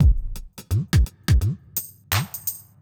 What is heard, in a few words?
lazy days, 85